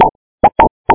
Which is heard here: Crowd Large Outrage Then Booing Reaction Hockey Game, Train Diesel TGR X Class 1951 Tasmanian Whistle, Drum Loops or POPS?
POPS